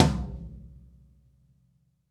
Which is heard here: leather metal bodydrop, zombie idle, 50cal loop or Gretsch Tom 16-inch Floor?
Gretsch Tom 16-inch Floor